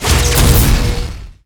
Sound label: Templar Holy Cry; Inotia 3